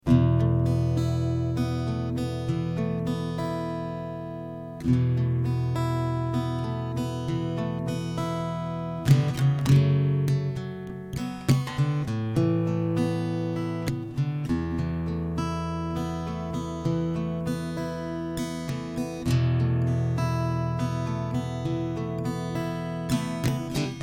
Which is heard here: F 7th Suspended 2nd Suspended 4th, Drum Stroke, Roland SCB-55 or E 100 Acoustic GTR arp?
E 100 Acoustic GTR arp